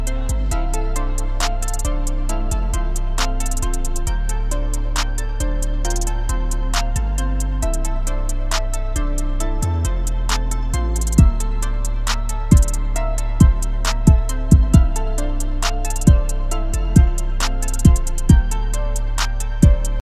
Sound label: Type Beat Trap Rap Instrumental